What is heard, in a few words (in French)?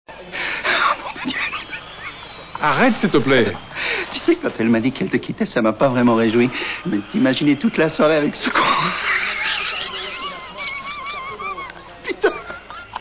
Rire